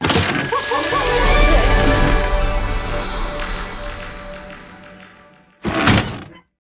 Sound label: pick and click bonus; fairyred